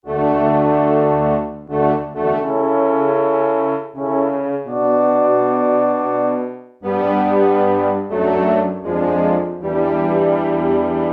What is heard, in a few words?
Horn Section, Yamaha TX16W; Unison Horn